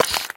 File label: spew, infestation, bulb